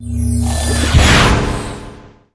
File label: night officer attack, monster